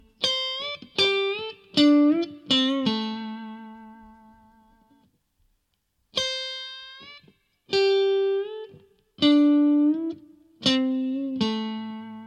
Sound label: Bending, guitar